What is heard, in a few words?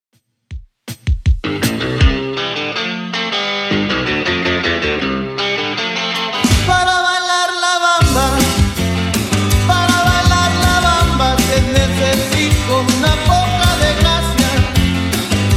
80s, 160bpm